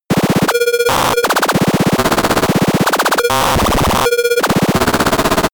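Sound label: arcade combat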